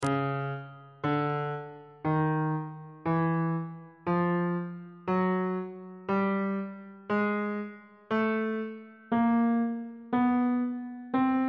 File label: c sharp phrygian mode chromatic scale 1 octave; bass clef